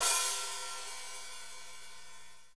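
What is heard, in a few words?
hi-hat, Pop Country, Wet; Northstar; Drumscapes Roland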